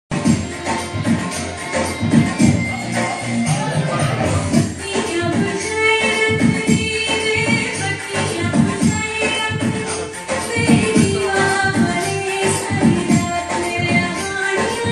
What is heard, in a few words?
Pakistani Song